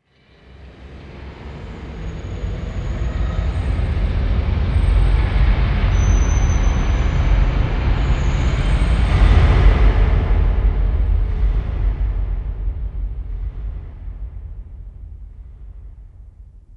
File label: Pillar Wet